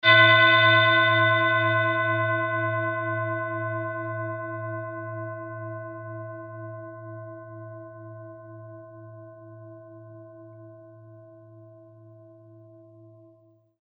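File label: tibetan bowl, a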